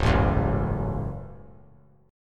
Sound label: Db Suspended 4th Sharp 5th 3C,3F,3A,3D; strummed; JGuitar